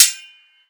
sword

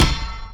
Shield Hit

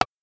Button Clicking Single

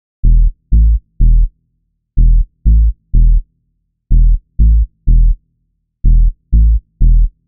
Minimal Dance Bass

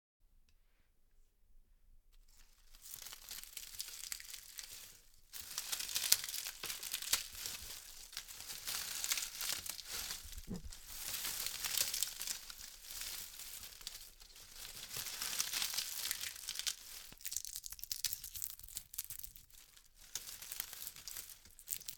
Crushing Dried Flower Petals

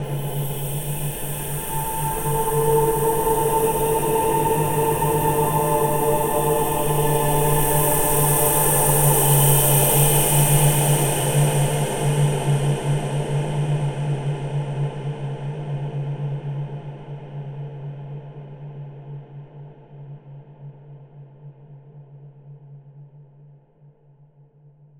Dronescape

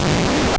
Record Scratch

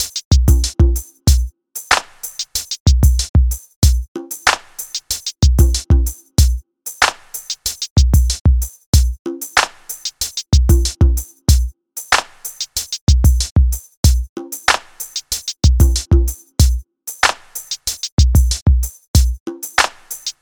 Hip Hop Drum Loop

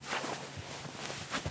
Rustling Duvet

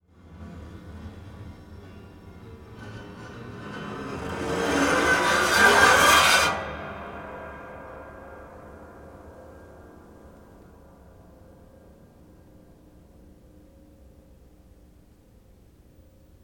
Scrapes

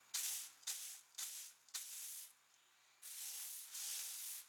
Broom On Sand

